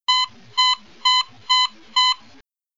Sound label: bip